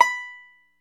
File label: ARCHTOP, Arch Top Banjo